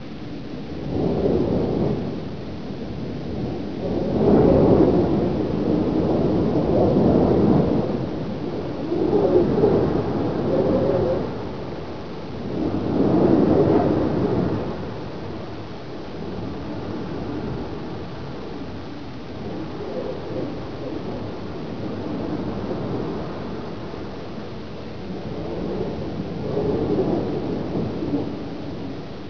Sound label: wind loop; hl1mp; monorail